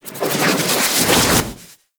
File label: Melee Charge Up Generic; Warframe; weapons